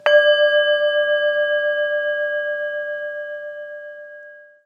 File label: Meditation Gong; Mudita Pure; bell